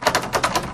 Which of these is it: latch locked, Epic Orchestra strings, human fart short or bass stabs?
latch locked